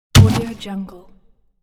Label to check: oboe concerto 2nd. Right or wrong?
Wrong